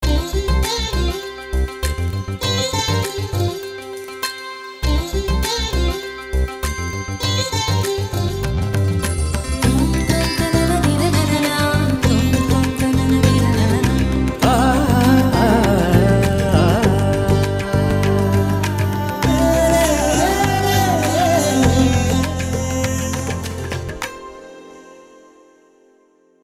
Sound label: Veenai Voc; Vectone